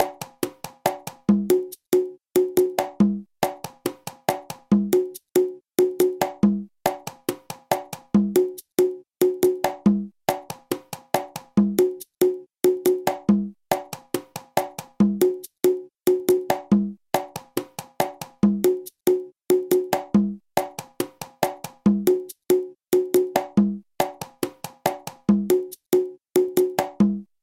dengue conga lent

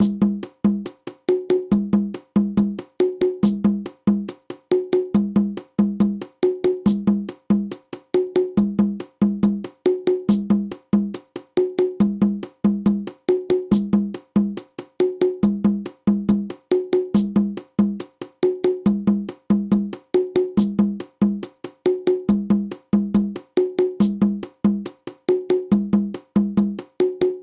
chaonda conga lent

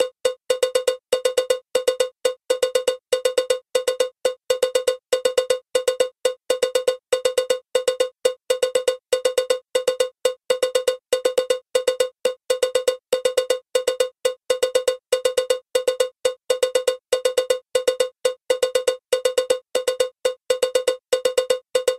mambo cloche

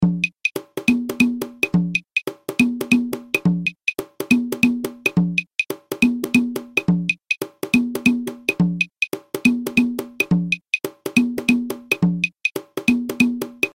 guarapachangueo cajon clave; clave 2 3

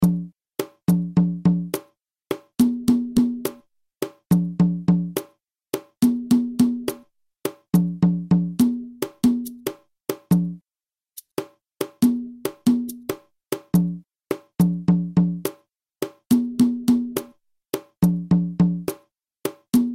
guarapachangueo cajon lent

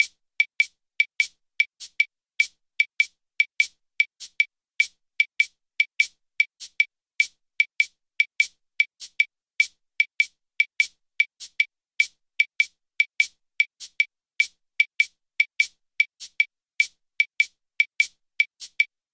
punto guajiro clave appui

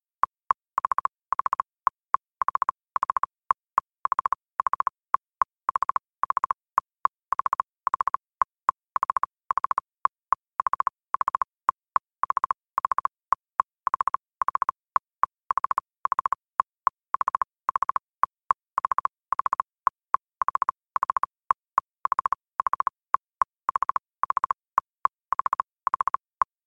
bolero espagnol castagnette

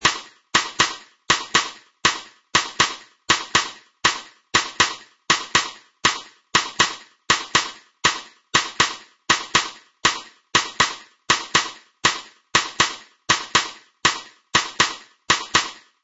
contradanza cinquillo

